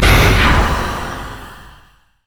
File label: morgana q dark binding; LoL